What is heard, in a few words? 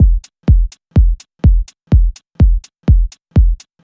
short tailed clean techno kick; drum loops